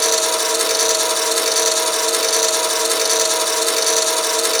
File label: Scope GUI Wheels